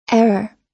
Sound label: error